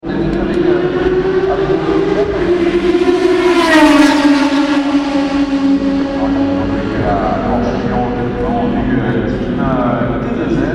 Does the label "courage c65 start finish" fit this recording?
Yes